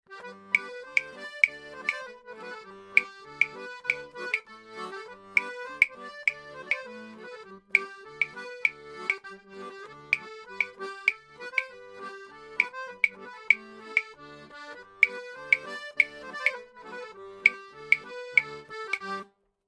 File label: Claves; 16 bit